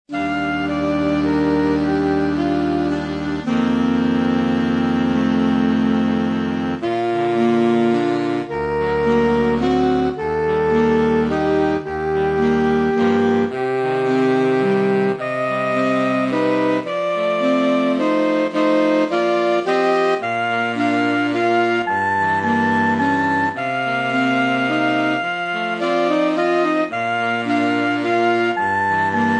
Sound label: - sax